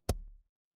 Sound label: ui pop